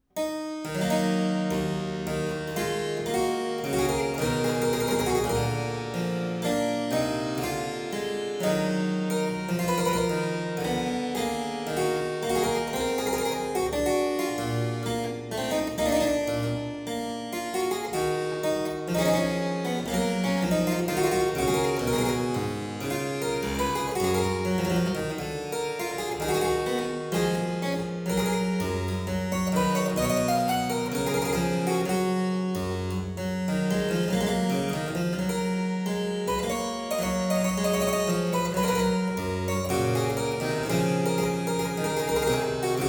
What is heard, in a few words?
E minor Allemande, Harpsichord; Buxtehude; Complete Harpsichord Music; Auf meinen lieben Gott; BuxWV179